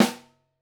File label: SN1 Overhead, Snare; Ableton Foundation; Snare Designer